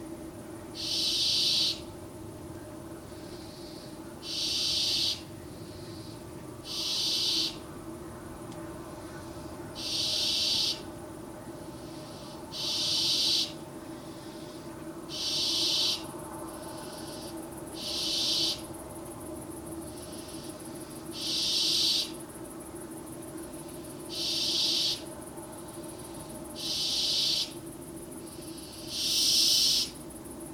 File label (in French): cris quémandements poussins Effraie; Coudray